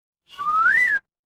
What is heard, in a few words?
cartoon slide whistle